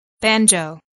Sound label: banjo